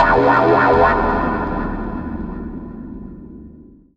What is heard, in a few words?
Wah; Blu Mar Ten; Jungle Jungle - 1989 to 1999 Samplepack